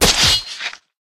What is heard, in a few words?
slicer, machines; Garry's Mod; hl2